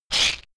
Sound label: shaker wood rough hit double soft